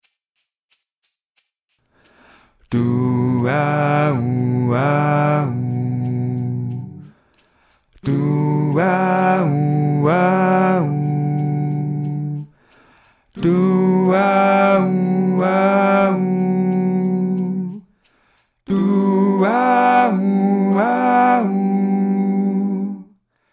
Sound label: Duauau Maj7 Alt